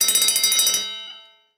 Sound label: Ticking Death Underlay